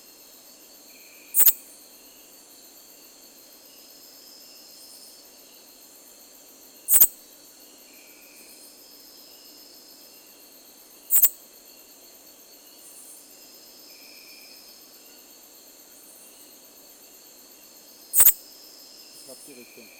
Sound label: Promecaborneana; POD14 ganze Sequenz Beleg